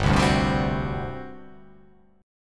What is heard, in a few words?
G Major 7th Suspended 4th, G, down